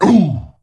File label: Troll Wound; Trollgore